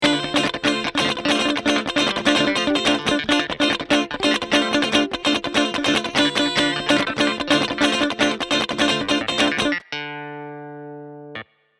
Guit Clav Group clean; Heritage Audio HA-609A

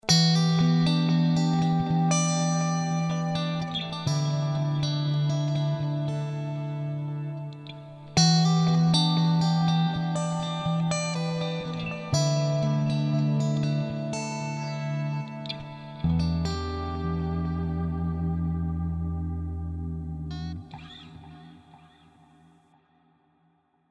Platonic Clean; Archetype Abasi

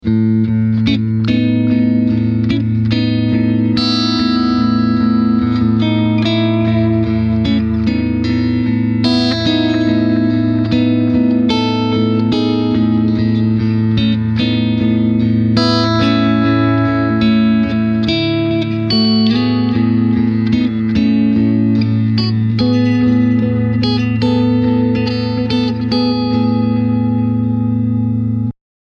Clean Tape Delay

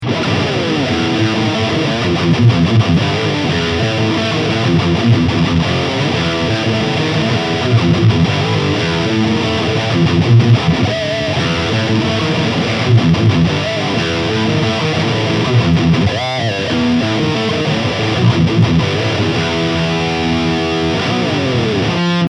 High Gain; RR X Series

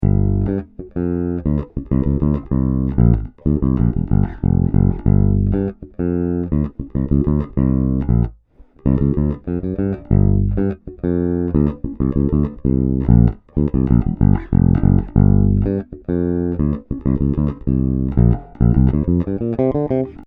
Music Man Caprice Finger

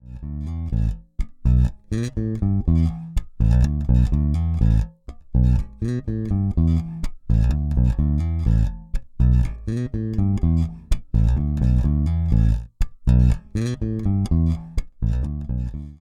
Precision Bass Both Pickups aktiv boost; Fender Troy Sanders

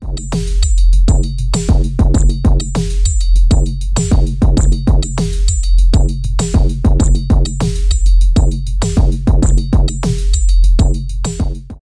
Sub Bass Generator; Elektron Analog Heat

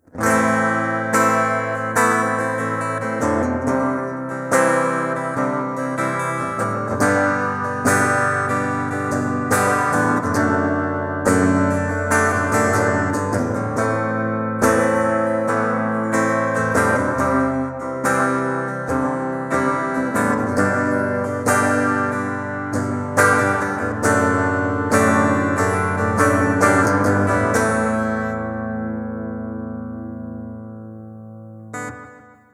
Valeton GP 200LT AC Sim

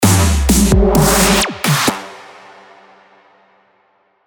EDM Short Fill; Nexus 3

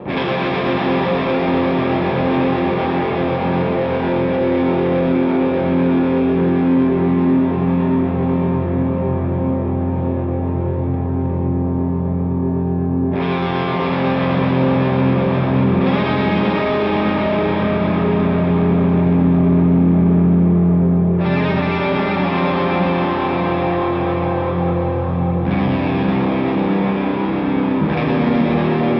Symmetry Reverb Delay Fuzz; Black Hole

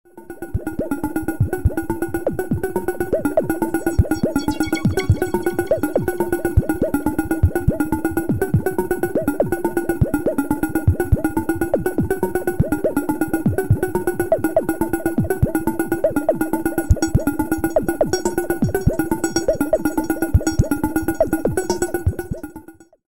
cs30 ring

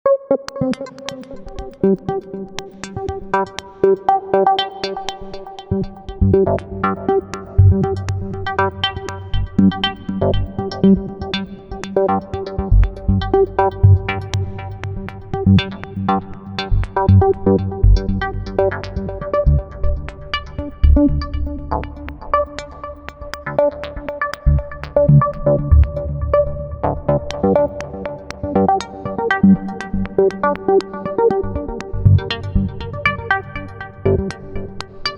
Waldorf Quantum

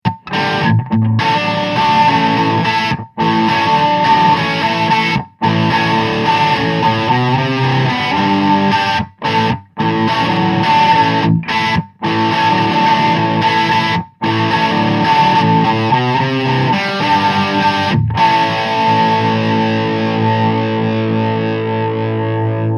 Notch Search

